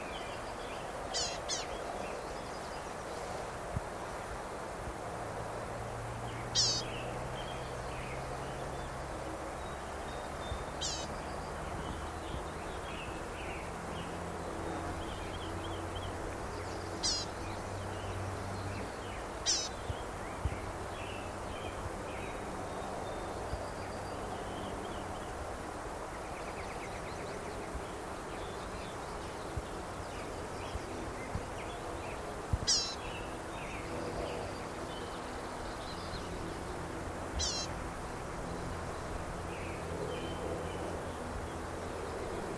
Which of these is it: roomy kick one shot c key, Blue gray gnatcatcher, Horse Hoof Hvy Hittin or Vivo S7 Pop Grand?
Blue gray gnatcatcher